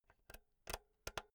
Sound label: insert jack cable slow